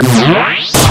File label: beam charge extended; freak fortress 2; sans